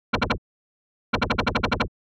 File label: Laser Gun